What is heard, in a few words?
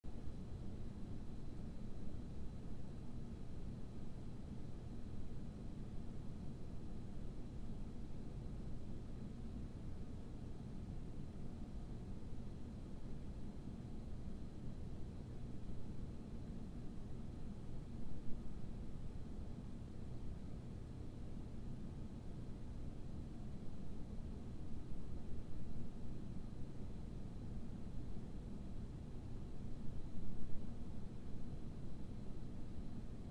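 Dead Air No AC Very Quiet, air fill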